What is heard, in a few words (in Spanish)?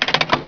Puerta Abre; Grounch